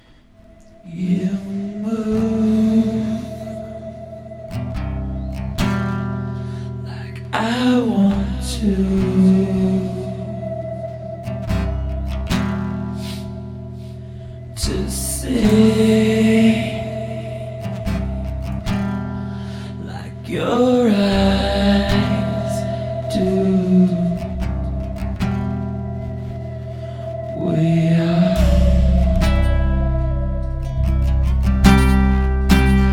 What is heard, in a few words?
Live Acoustic